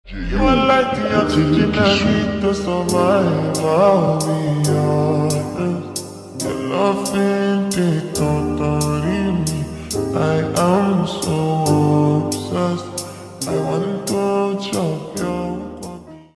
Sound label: remix slowed